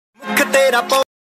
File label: Punjabi Song Ringtone